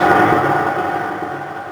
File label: explode